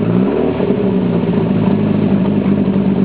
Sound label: exhaust rev, Lotus